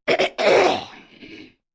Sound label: Cough Clearing, Cough, 1 child, clearing throat, mucus, human voice, kid; Sick; Voice Box